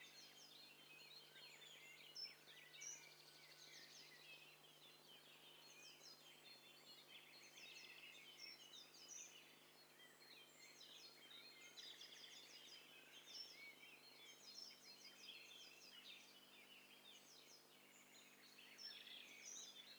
STEREO BIRD COCKTAIL PARTY

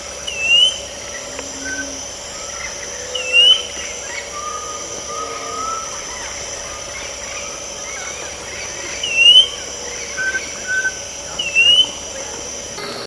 bird

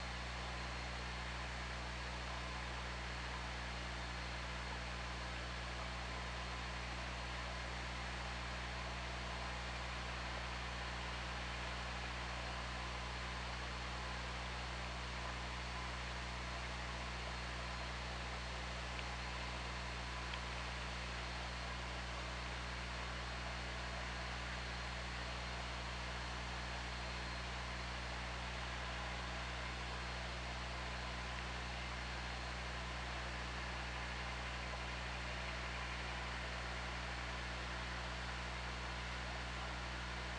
OrcaLab

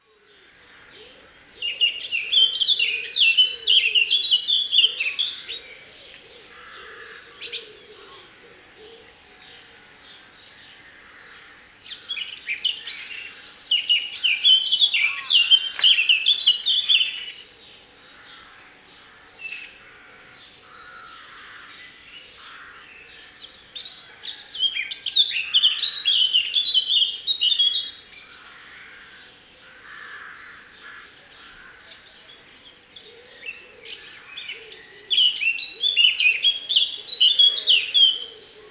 Sylvia atricapilla; SETE

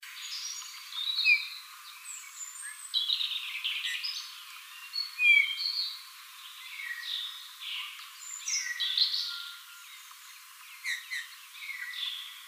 Pyrrhula pyrrhula; SETE

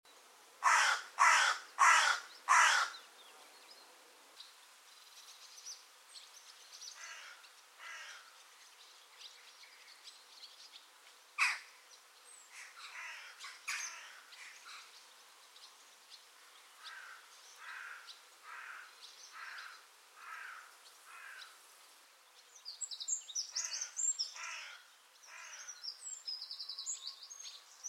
Corvus corone; SETE

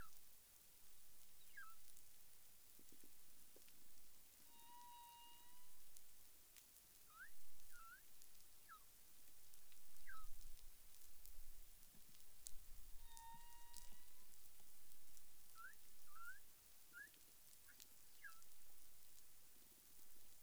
humpback whale; CARIMAM

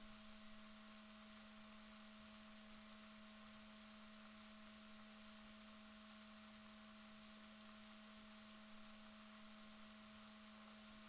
rorquals positif, Balaenoptera Physalus Chile

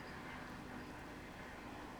pulses, BALAENOPTERA; MONACO 2022-07

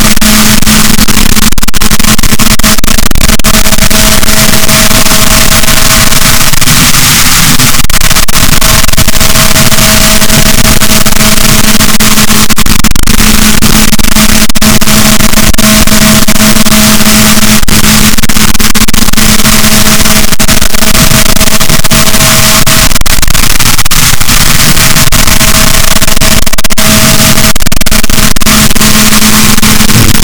rorquals, Balaenoptera Physalus, boat

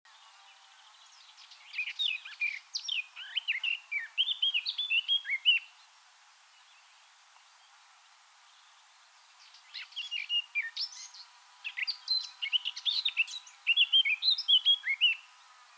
Sylvia atricapilla; SETE

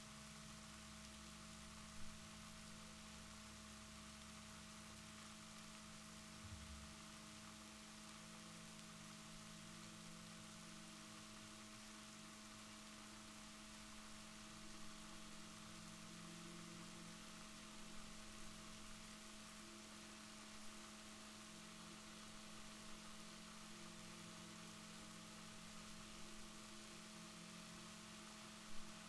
rorquals positif, Balaenoptera Physalus Chile

